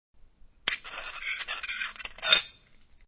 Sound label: Lid from tin can placed on counter.; Kitchen; Household